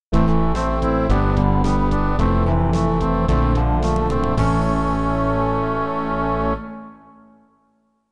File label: Jingle (MT-32)